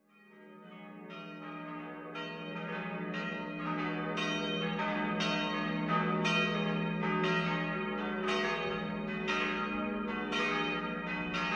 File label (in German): Süßer die Glocken, Glocken von St. Georg Oberdolling